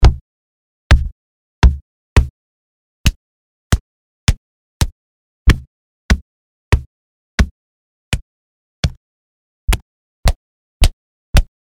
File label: punch